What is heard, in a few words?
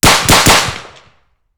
IMI Tavor TAR-21 5.56mm front right Triple shots; 20m; NT4